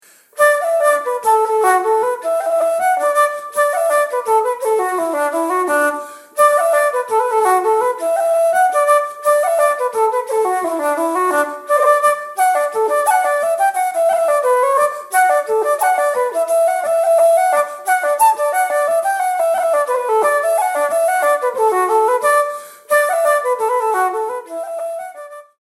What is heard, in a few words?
Flute